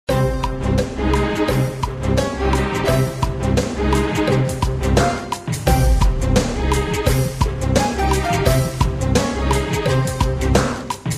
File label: In Game Music (20 Second Countdown); Kahoot